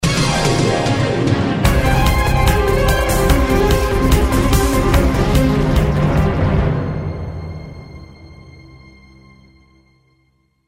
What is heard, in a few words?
Accent